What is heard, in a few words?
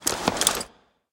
chariot bridle; smite; Apollo